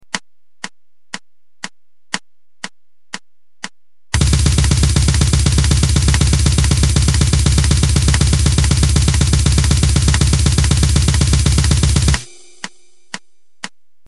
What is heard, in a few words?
blast bd